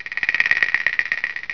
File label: Rain Forest Menu Popup; 250 Desktop Themes